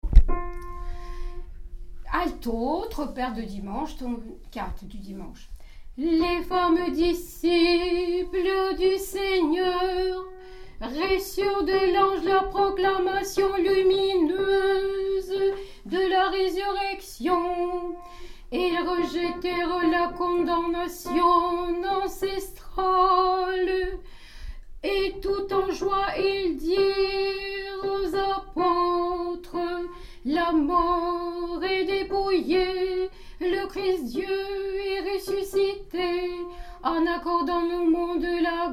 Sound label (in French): Tropaire ton 4 Alto; Voix alto